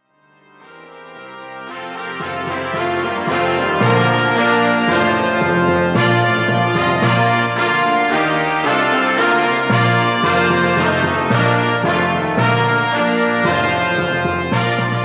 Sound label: brass